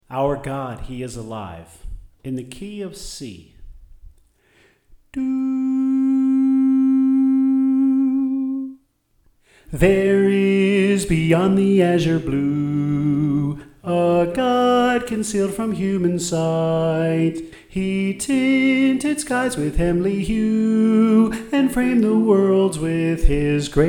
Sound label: Soprano